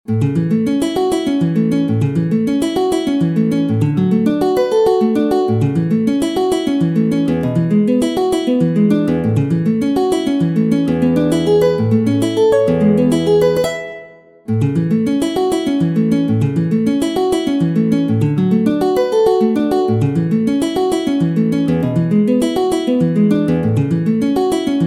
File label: Allegretto, Classical guitar; Matteo Carcassi; Op. 60 Vingt cinq études mélodiques et progressives; No. 20 Allegretto brillante